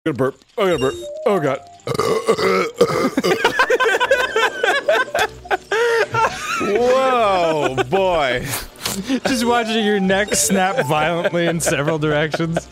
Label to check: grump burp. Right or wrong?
Right